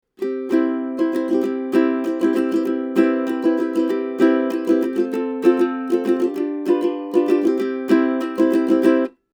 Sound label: Schoeps CMC64 Ukulele 30cm